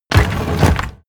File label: mechanical lever stone medium down